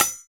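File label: PER PROCU, Procussion, percussion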